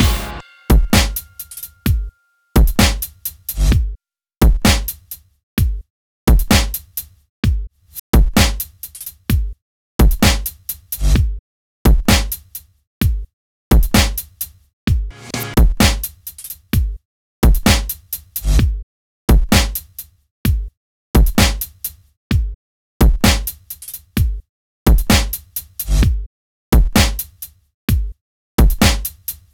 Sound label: drums + chord, 129BPM; Pony Fan Music Remix Stems